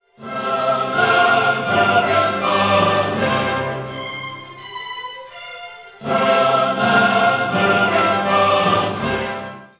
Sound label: Agnus Dei Strong descending